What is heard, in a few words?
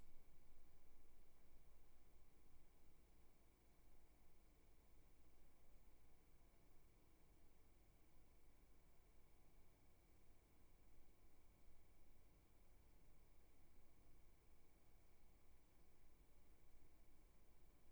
roomtone Blower